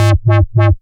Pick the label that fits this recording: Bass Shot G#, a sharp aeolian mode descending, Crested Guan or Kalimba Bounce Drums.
Bass Shot G#